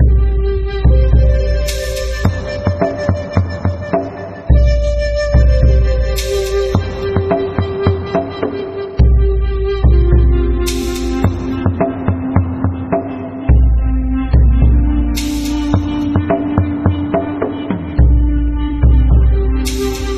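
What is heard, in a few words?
select loop; mir2; Return of Legends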